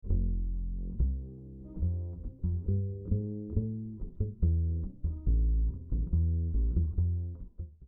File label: Bass DI Raw